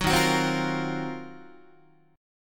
Eb Minor 6th Add 9th Eb down Guitar 4A,4E,5A,5D,5G sharp,6C sharp; strummed